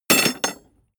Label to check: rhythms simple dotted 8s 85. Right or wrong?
Wrong